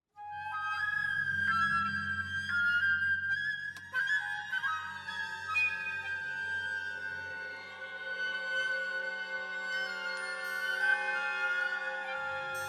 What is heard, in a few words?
waves a beautiful noise wafted across